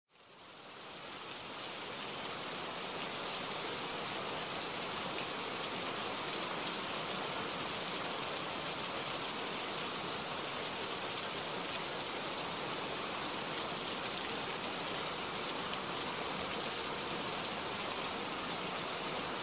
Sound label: rain light